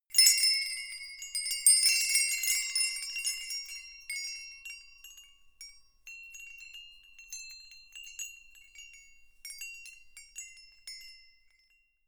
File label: windbells ringing